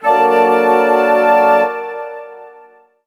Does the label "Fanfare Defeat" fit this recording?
Yes